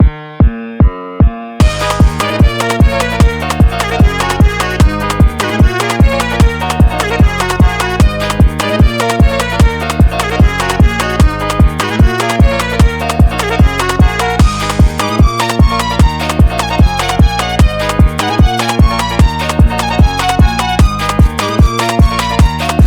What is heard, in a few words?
sped up